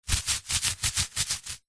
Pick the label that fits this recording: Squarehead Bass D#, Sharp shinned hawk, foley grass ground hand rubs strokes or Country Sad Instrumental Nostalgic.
foley grass ground hand rubs strokes